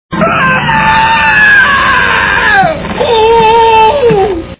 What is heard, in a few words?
ahh oof; courage